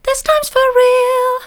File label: this times for real soft, vocal